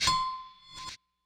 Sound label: Bell Drag Reverse